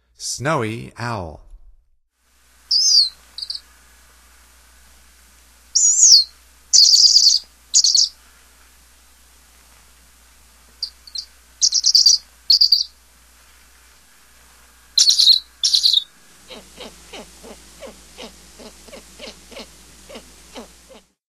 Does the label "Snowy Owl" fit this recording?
Yes